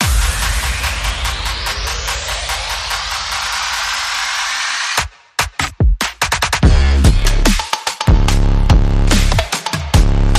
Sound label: Percussion Bass and Effects